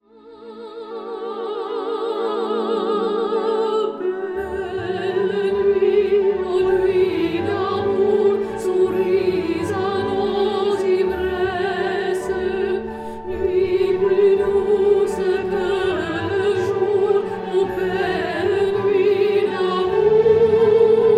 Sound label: Barcarolle Duet